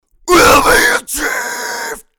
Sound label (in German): Scream absichtlich erste Silbe uebersteuert; Millennia HV35